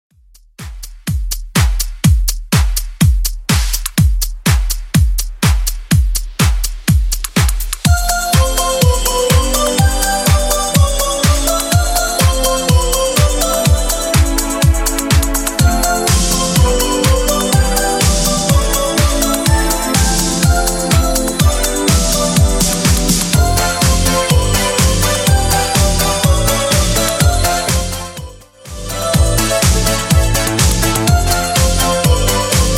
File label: 80s Extend Redrum 124bpm